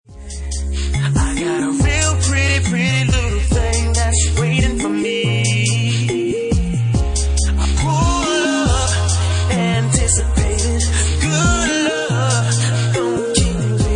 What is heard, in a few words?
Nice Slow Organ Mix